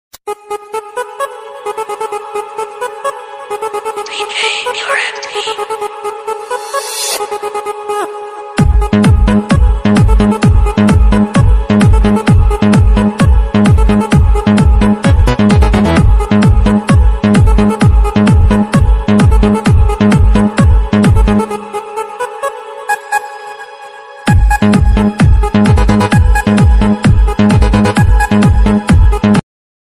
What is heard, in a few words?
triangle violin classic ringtone bgm